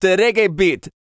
REGGAE, Vocal Planet World Voices

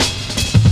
Samurai Cut, Drum Loops, 165.5, Jungle; Zero-G Jungle Warfare 1, 2 & 3

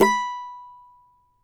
CAVAQUINHO; Spectrasonics; Hans Zimmer Guitars VOL-2

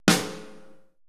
80S DRUM SET

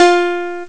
ac piano; Amiga Soundtracker Sample Packs

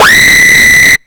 EL SCREAM, Hard & Loud Techno; USB Soundscan vol.01; AKAI

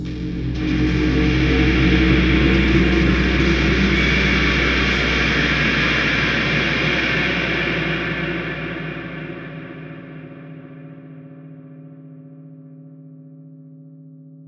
Guitar Ending; Sonic Foundry (Sony Creative Software); Ugly Remnants VOL-2; UR 2 FX (Long)

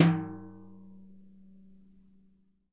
TIMB BR HI H, Synclavier Percussion; Synclavier Percussion 2; World & Orchestral; ILIO

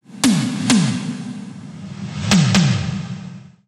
130 Beat Fill; Zero-G - Future Wave

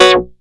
short chor; Masterbits; Vintage Voltage; SEQ-FX

